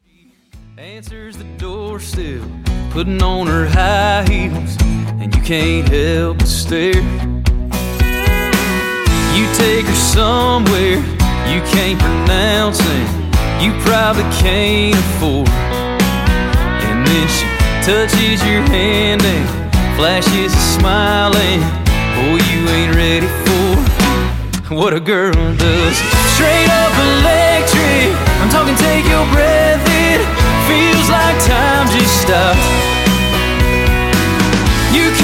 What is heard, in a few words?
Dj Intro Outro 113Bpm